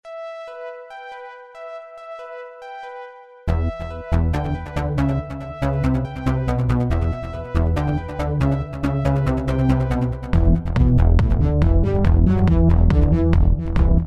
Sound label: bomb planted